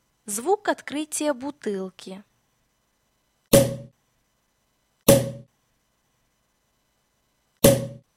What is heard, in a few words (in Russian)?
Звук открытия бутылки